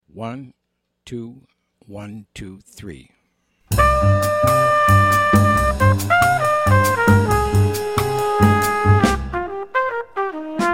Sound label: minus Piano